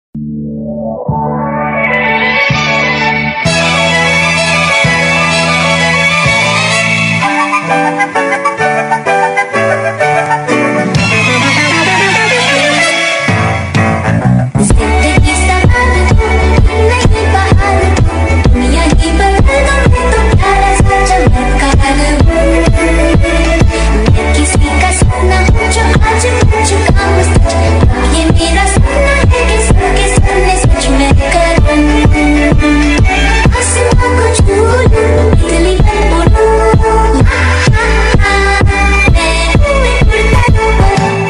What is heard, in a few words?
special ringtone; insta tranding original audio